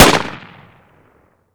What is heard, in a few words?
dp28 shoot, weapon